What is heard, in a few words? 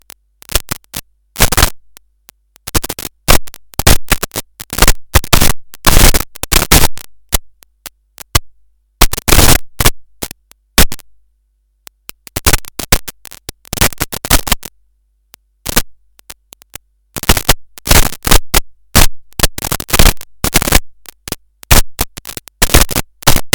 Noise Gate Processed